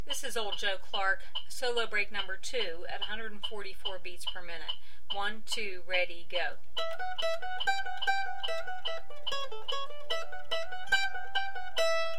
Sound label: Solo 144bpm, mandolin